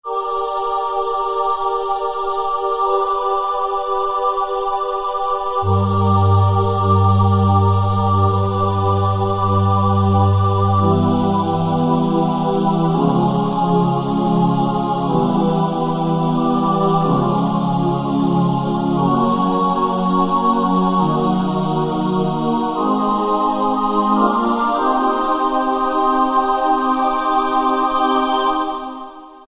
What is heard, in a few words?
Aah Choir, Yamaha EX5R; Factory presets